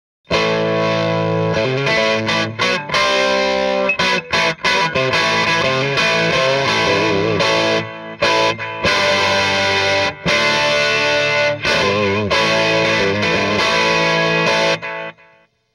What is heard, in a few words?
lp brpup clean deluxe